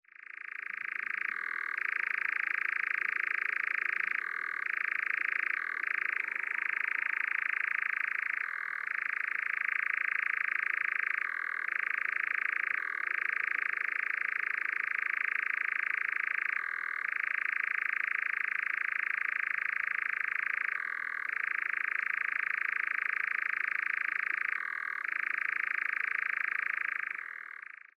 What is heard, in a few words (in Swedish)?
Nattskärra